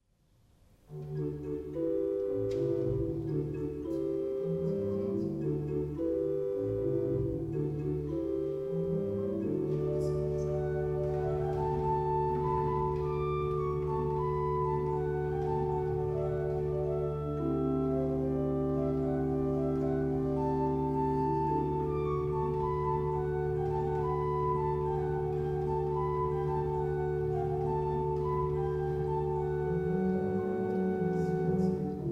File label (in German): Herzlich Traversfloete; Orgel; Vilsen 2018; Orgelschaetze